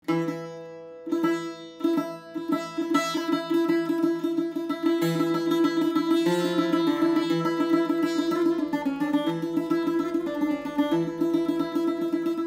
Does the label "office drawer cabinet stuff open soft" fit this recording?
No